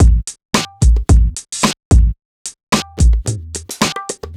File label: 110 Pop Drums B, Fast Tempo; Pop Rock; Digidesign; Pro Tools; Pro Tools Essential Loops